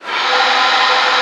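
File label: English Horn p Air; Digital Sound Factory Virtuoso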